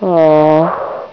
BABY UHH

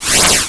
PASSBY; HIGHTECH

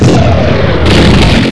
pill down

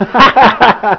LAUGHS; HUMOR

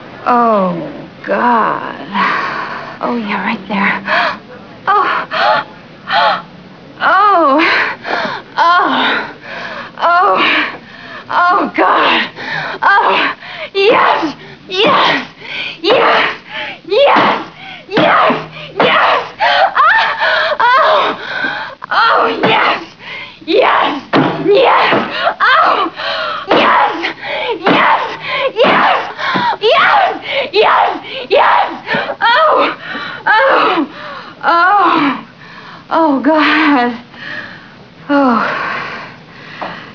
ORGASM2

